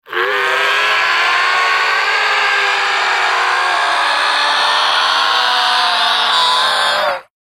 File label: Tormented Screams, Tortured painful male screams; Tortured painful male screams to make your blood run cold.; Warped; Sound Design